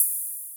Open Hat; The Lunch77 Sound Design Mini Kit (Vol. 1)

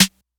snare west coast

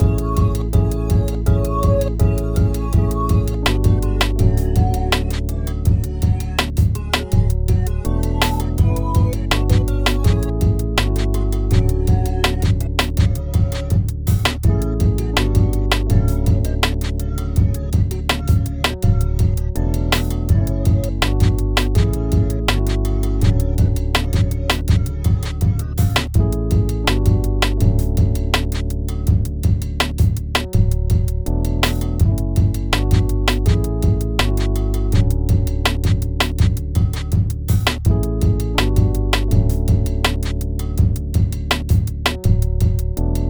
Drum Kit

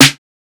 snare

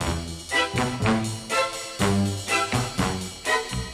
Loop

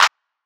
Clap

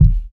hardcore kick; Kicks

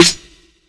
snr; Snares

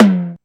Tom, LinnDrum

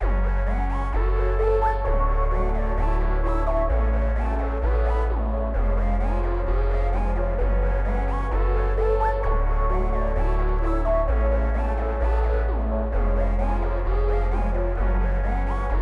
130 Bpm; Sub Uzi

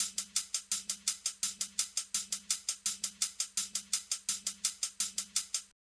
Live HiHat Loop

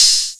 open hats

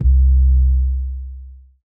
Not Nice 808's

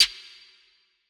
Reverb Snare

